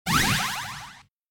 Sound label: Powerup